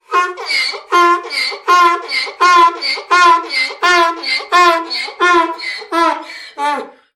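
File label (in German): Esel schreien iah Sound Geräusch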